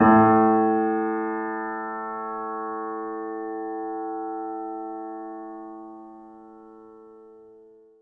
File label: piano p a2, Grand Piano; Sonatina Symphonic Orchestra